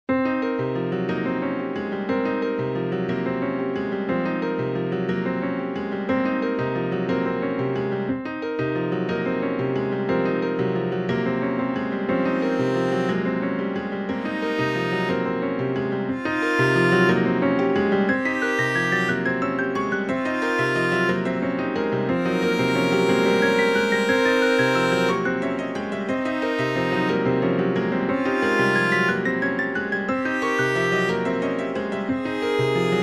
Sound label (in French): piano